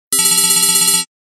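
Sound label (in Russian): рингтон; cyberpunk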